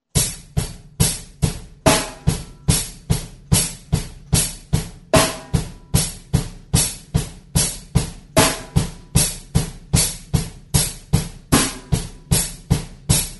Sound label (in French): exercice, batteur